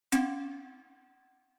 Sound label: error; twblue